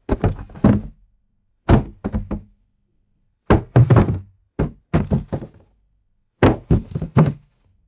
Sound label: wooden coffee table knocked over